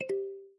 Button Undo; Real Dealer European Roulette

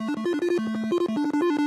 reel Spin; Diamond Empire

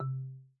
Button Common; Real Dealer European Roulette Classic

